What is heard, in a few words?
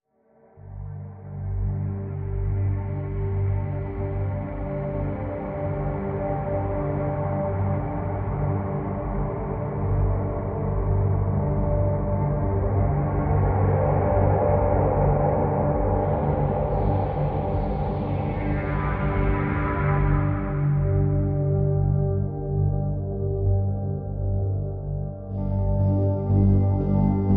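Drn Dimensional G, drone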